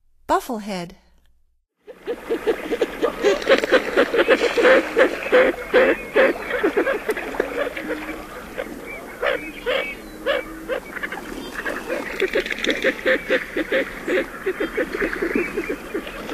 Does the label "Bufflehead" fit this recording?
Yes